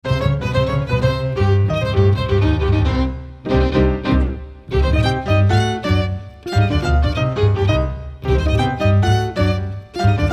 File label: rumba